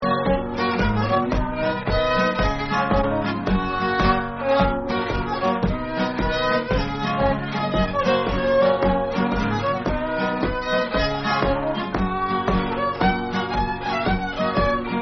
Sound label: 6 8 G; Nameless Tune